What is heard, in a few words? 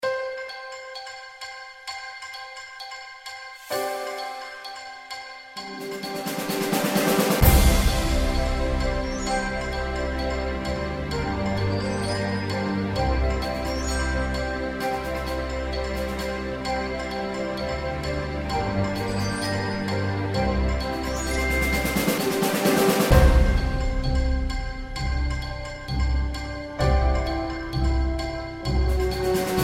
explorers melody